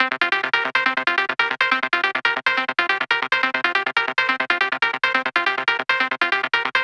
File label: 140BPM C; Vengeance - Essential Clubsounds Vol.4